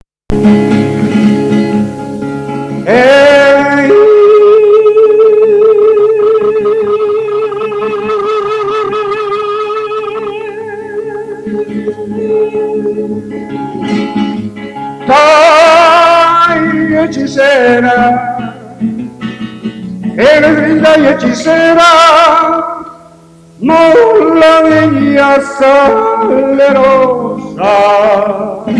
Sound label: Vocal